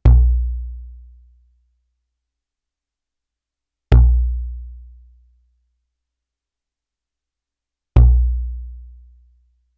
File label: Retro Tube sin Pad